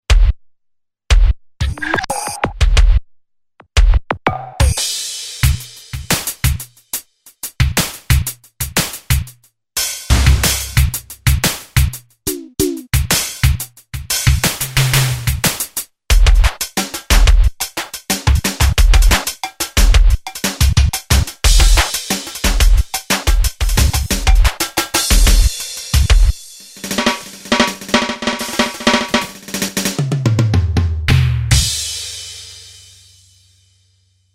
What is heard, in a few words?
grooves